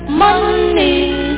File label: doorbell